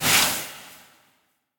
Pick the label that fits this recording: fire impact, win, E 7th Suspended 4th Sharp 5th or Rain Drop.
fire impact